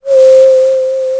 whistle

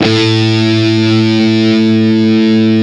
Rich Metal, a2; BGM; Nintendo; July 2020 Gigaleak

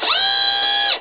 door; Nintendo

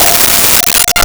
Celeste F5; Nintendo; July 2020 Gigaleak

